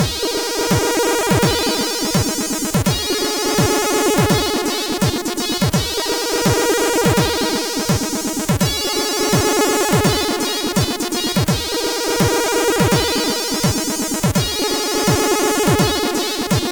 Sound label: Funky Medina, sid CSG8580R5; hvsc